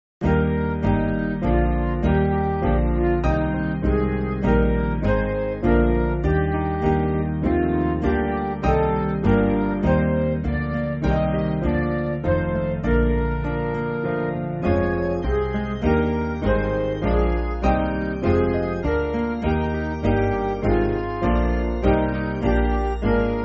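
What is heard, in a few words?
Midi, Backing Files for Churches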